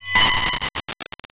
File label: derezz